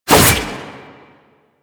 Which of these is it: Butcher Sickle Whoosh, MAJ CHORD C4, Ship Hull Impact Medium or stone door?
Butcher Sickle Whoosh